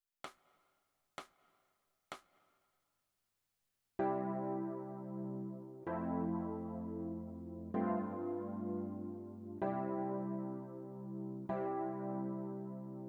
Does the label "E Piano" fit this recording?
Yes